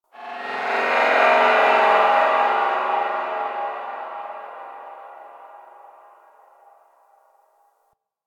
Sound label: Guild Mid Texture; Ambience; Warframe